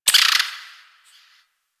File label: Forest Distant Medium Creature Tree Small Call; Warframe; Grineer Forest